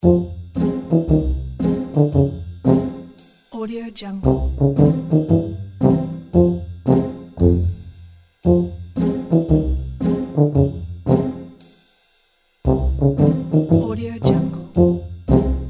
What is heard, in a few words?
tuba and pizzicato comedy